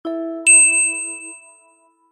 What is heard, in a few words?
Message Tone Iphone